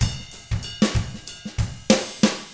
95 FUNK, Drums; ILIO; Double Platinum Drums 1

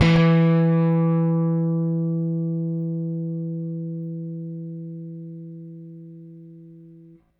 Rickenbacker Bass; Airwindows

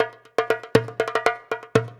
120 CONGA; Best Service Advanced Media Trax Modern Composer

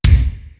BELLOW BD; PROC.BDS; East West; Global Sounds

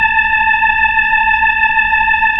B-3 Chorus

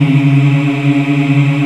VOX AFMM, VOX Afro Mms, Africa; Roland LCDP11 Africa VOL-1

